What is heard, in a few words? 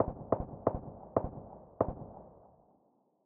aug tap fast; SPT Battle Ambience